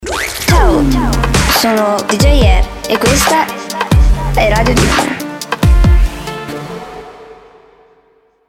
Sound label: DJ jingle